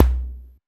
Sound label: Tom Low; One Shots; Drums